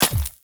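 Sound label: gun, impact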